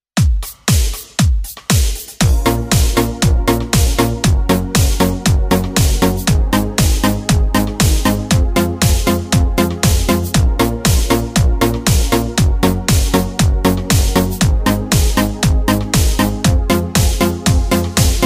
euro disco music korg pa4x style 80s disco instrumental